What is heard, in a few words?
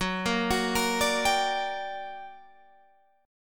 G Minor Major 7th, Gb, guitar chord